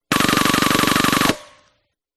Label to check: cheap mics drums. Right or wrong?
Wrong